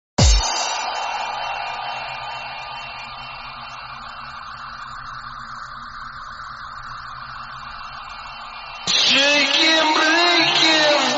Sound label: Hardbass; Cheeki Breeki; Anthem